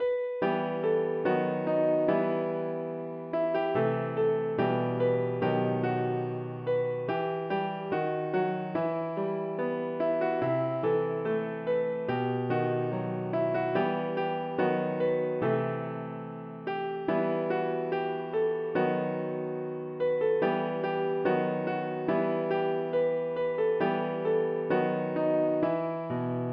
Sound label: piano